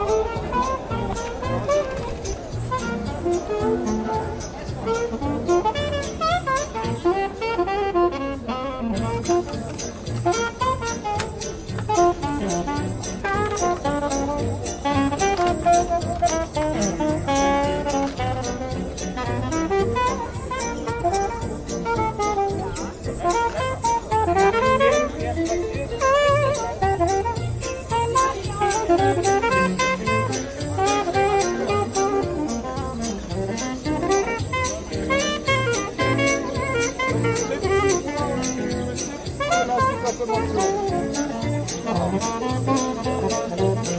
street music, Berlin Mitte

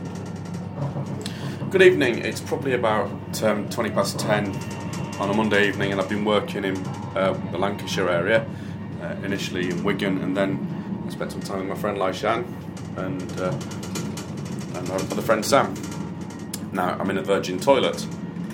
chattering toilet; virgin trains

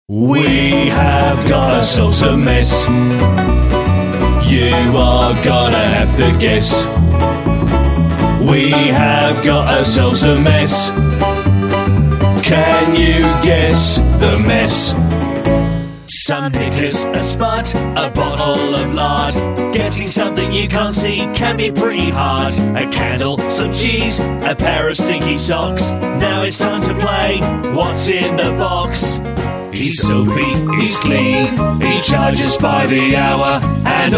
competition jingles

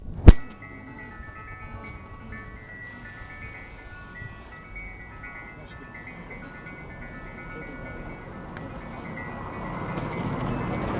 water sound installation

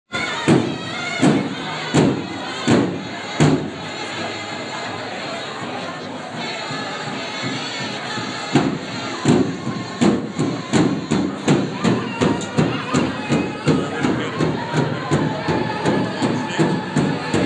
drumming

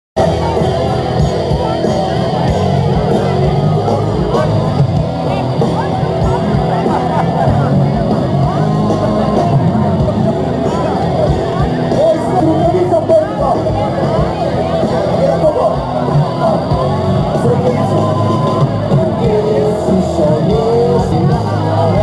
stanley cup party hrusica slovenija

